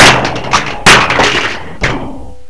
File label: 2 players crash; Macworld (1998-10); Games World; Shareware Games; Arcade; idin 2.0